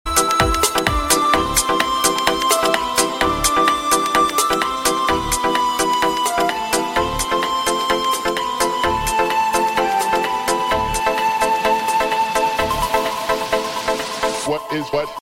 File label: arabic love ringtone